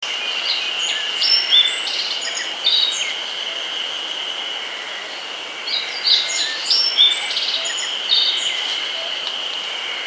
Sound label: Emberiza spodocephala